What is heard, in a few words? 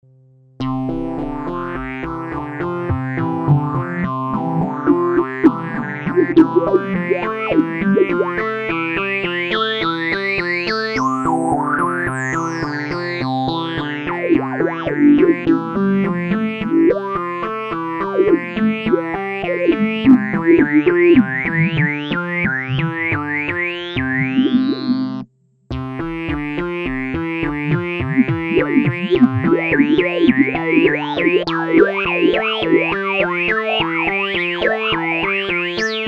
analogize Filter Factory Qtron